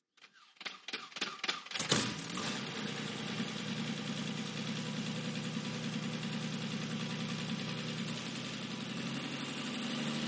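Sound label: Bell 206 Strt Idl Awy, helicopter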